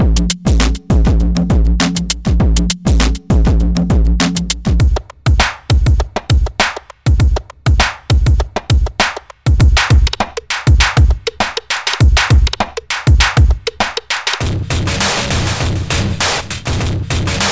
industrial beatings

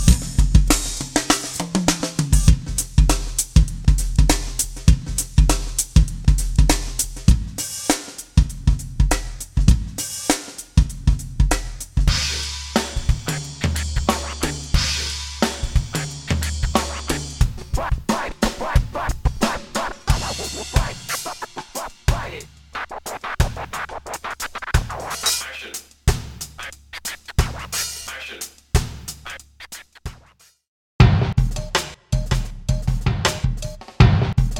urban grooves

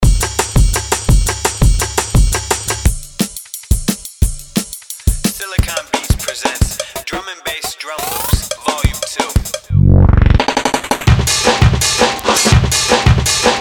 dnb drum loop vo

